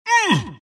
wraith pain; dota; skeleton king

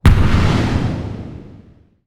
rebound impact; weapons; dota; marci

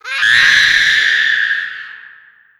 scream; hallow; dota; conquest

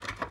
run creak; npc; courier; lockjaw

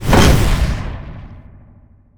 tower projectile; damage; player; physics

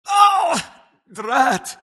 death; skywrath mage

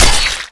attack; dota; bloodseeker

